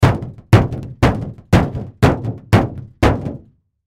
Impact Car Hood Roof Walk Run Metal Bang Multiple; Blastwave

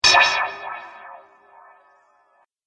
Mangled Metal Impact; Miscellaneous Impact; Andrew Fleming

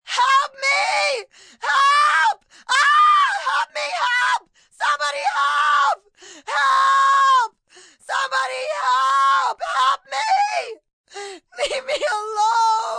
Help Me Scream, Calls, distress, help, female; Exclamation

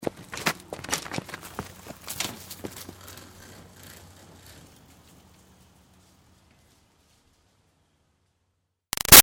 Bicycle Pickup Away Fast; Ride Away; Bicycles; Vehicles

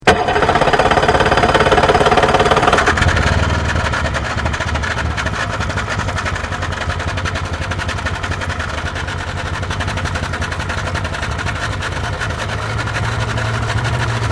Boat Small Startup Move To Distance; Various private boats; Boats (Sound Effects:Vehicles)

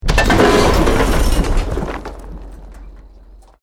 BUILDING COLLAPSE SCAFFOLDING SMALL

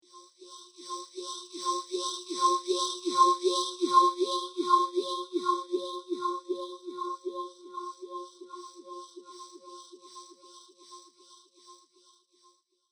Aliens Searching For Life

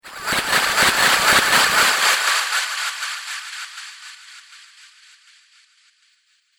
Laser Future Splash Beam; Sci-Fi Laser Sound Effect

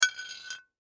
Pipe Pick Up Drag Slide On Cement; Machinery; Foley; Blastwave

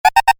Computer Beep, Short; Multimedia; Stuart Duffield

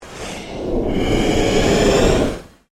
Ambient Pulse Ghost Chatter

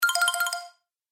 Musical Game Elements, Video Game

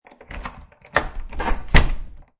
Lock Front Door, Locking front door of house; Miscellaneous Doors (Sound Effects:Doors)

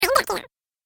Gremlin Chatter, monster sound effect; Horror Sound Effect

